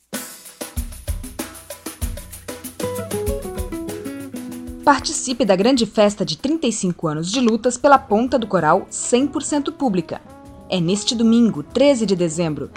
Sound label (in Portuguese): vinheta festa ponta